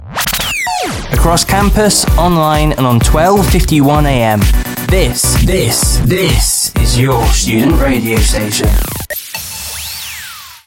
top of hour jingle; RAW 1251AM

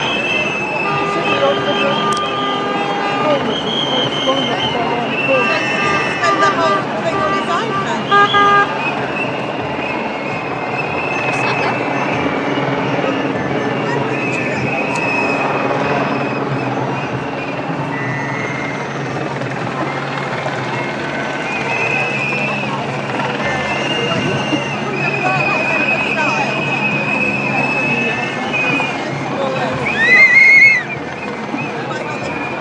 protest march outside parliament

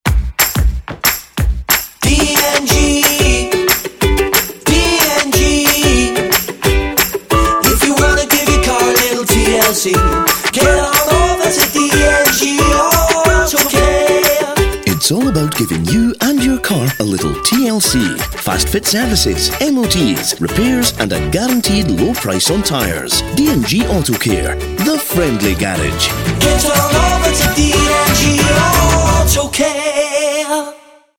jingle; g-autocare

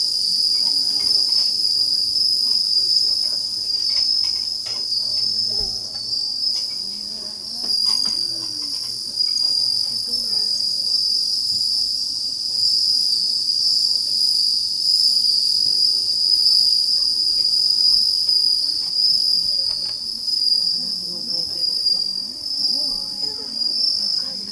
suzu mushi recording

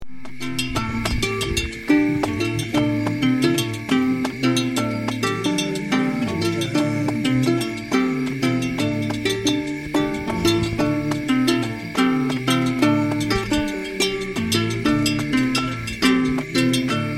geedal bow harp played in the forest

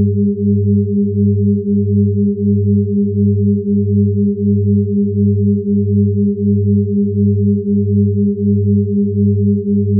hemi sync tone

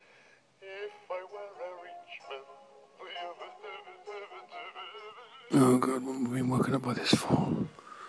alarm clock

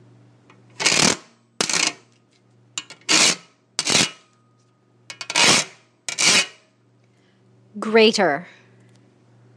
grater, kitchen; soundsemioticsofthekitchen

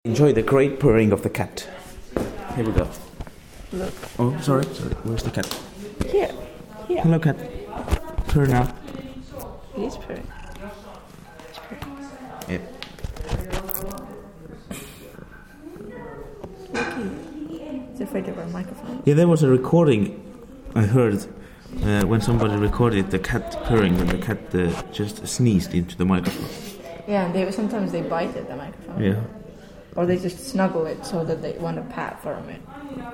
the cat; crappy right channel mic cable

